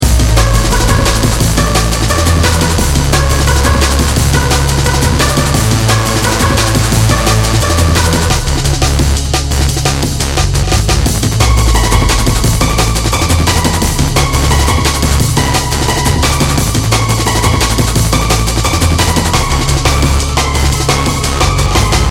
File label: Ringtones; SubMerge